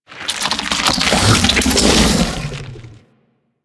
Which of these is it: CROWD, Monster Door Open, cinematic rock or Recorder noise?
Monster Door Open